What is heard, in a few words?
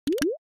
staple bops